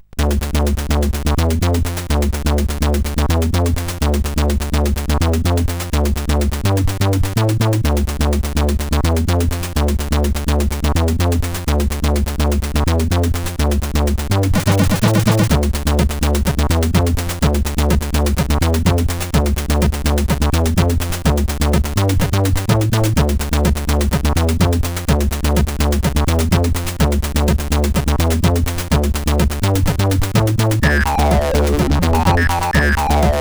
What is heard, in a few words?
Remix, CSG8580R5; Budbrain; hvsc